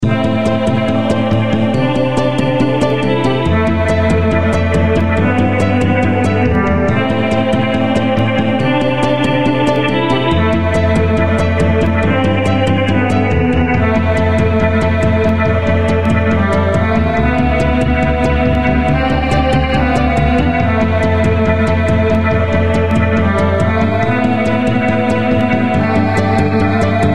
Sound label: boombox